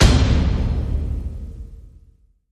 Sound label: One Shot Top Hit